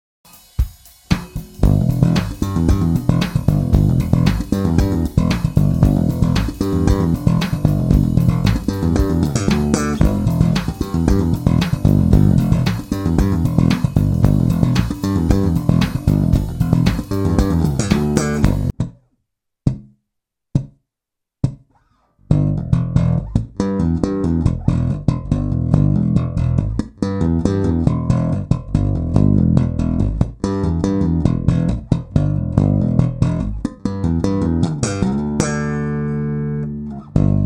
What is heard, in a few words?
Slap in E with Pulloffs